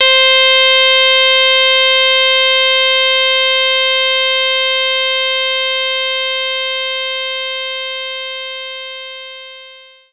Sax C5

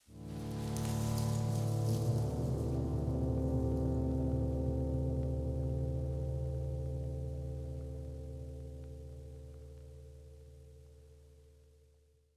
Jingles

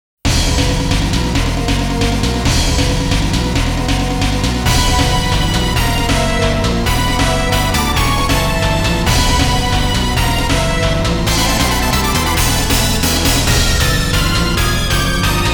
Emotional upset; Valkyrie Profile

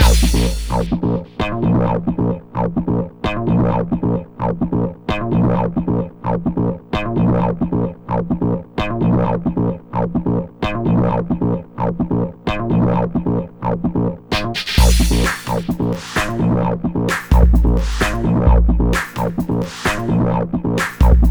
Pluck

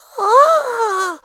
in awe; Scrat

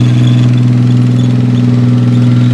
tank on mid; American Fugitive

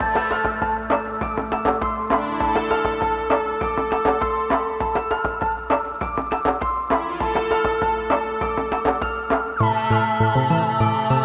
Acolyte Stinger; dying light the following gamerip 2016

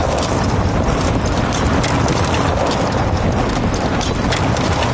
Vehicles Generic

